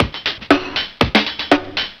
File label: LOOP; SoundFactory; DRUMLOOP